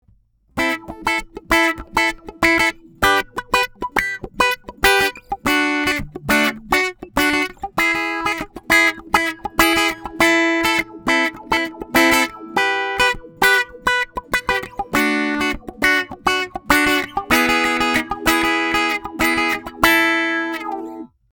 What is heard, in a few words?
zoom a3 auto wah